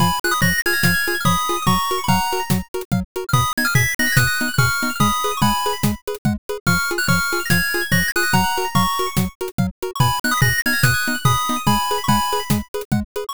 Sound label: bgm bright